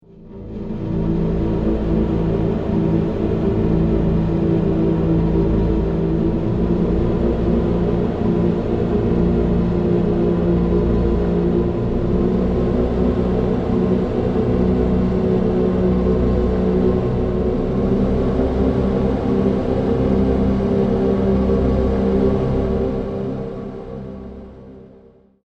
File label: space